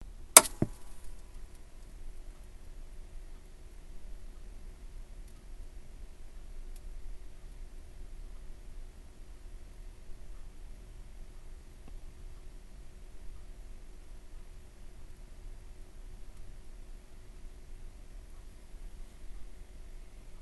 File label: High Pitched Tv Frequency